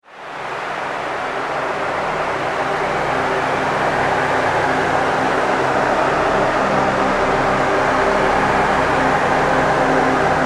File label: Demonic Transition